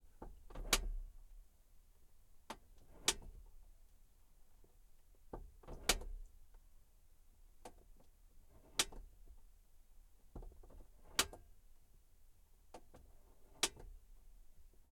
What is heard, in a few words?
Morris Oxford - Temperature and Fan Dial - INTERIOR - Front Window; Pole Position; Morris Oxford 1969; VAR SFX; DPA4061